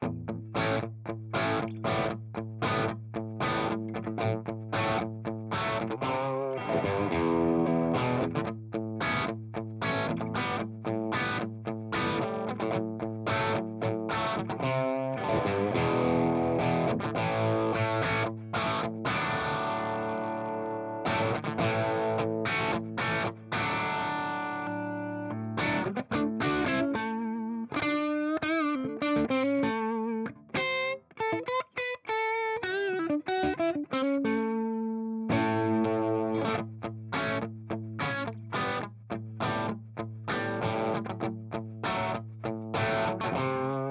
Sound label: Fryette rhythm